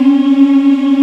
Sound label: African Mm; Roland LCDP11 Africa VOL-1